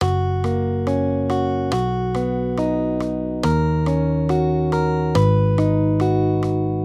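Guitar, Accompaniment, Major Triads, Gb-B, 140 BPM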